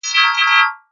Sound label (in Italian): ciottoli zeitverz; acque